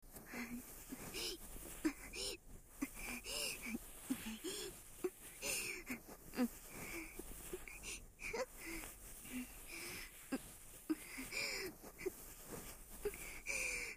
ループ梵天（左立体版）, ASMR

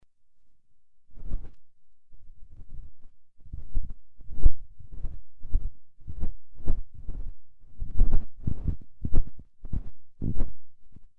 耳かき, ASMR

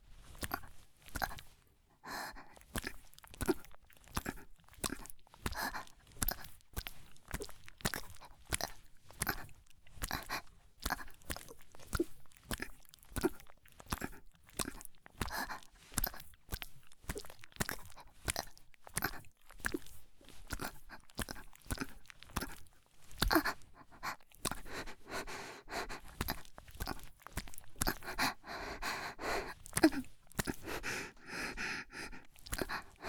ギシアンループ; 無感情ダウナーヤンデレ少女に弱みを握られて…

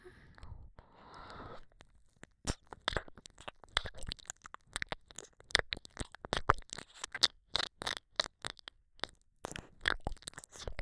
ちゅぷちゅぷちゅぷ…, ASMR

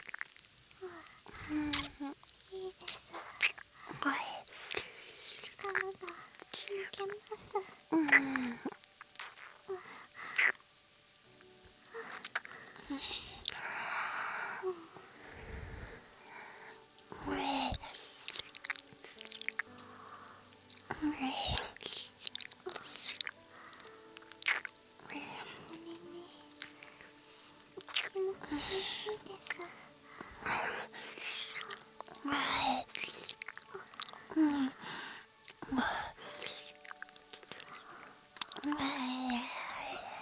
耳舐め, ASMR; 人気実況者の耳舐め

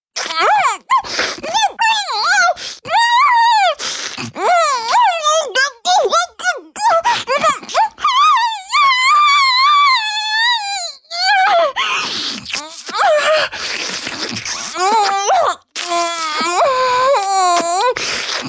口内射精→飲精, チュパ音; チュパ音抜粋集

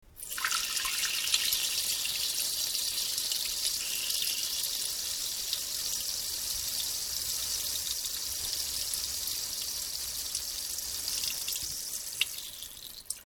じょじょおぉぉーおしっこ

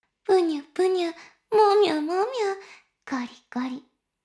しこしこ音声; 少尉; 牧場戦腺

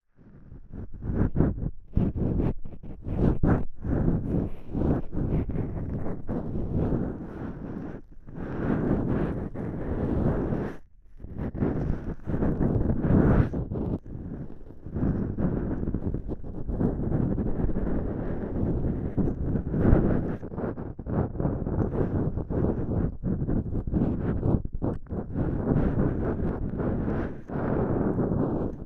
耳の奥までゆっくり丁寧に綿棒で耳かき 両耳同時, ASMR

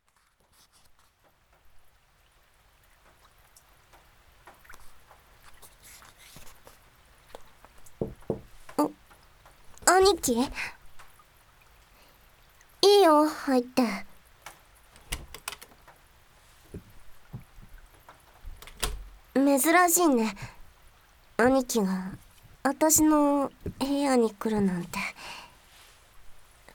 雨降りバージョン; あ～にき！いくら出す?

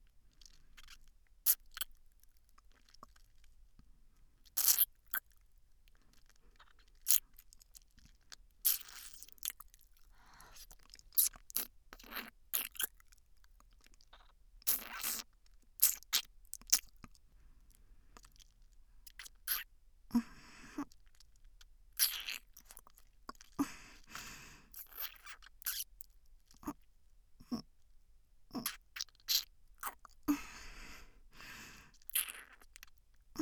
説明無しのちゅぱ音だけ; 説明無しのちゅぱ音だけを楽しむファイル

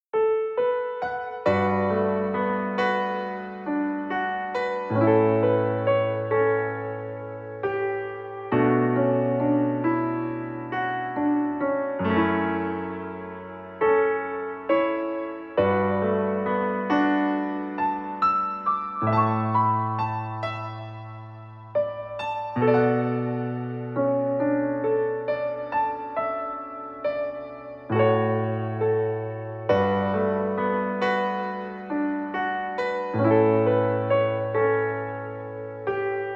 ピアノ

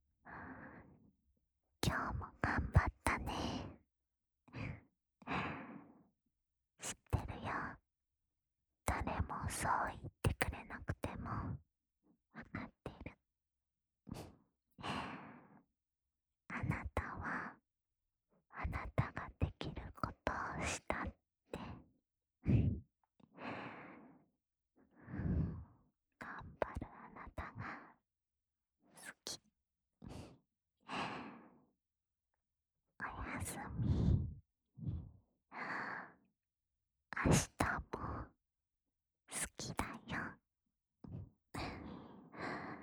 吐息たっぷりの添い寝, ASMR

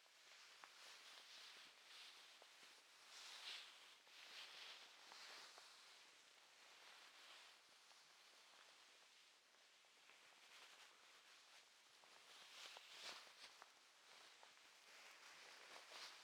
布団もぞもぞ

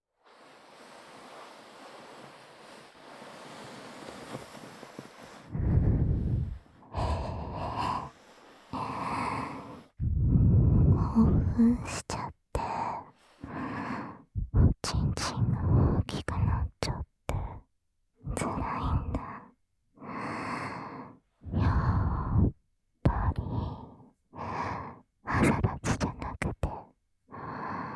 密着首筋、乳首、耳舐めで声我慢

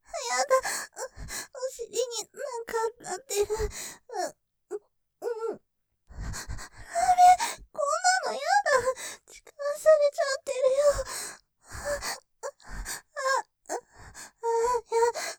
喘ぎ; お尻になんか当たってる；；痴漢プレイ; ループで楽しむ！シェフの気まぐれ抜き抜きコース

